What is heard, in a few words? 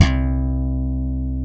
Alembic Pop C1; Mo' Phatt; Digital Sound Factory Mo' Phatt